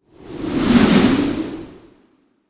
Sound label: Ice Shard Wind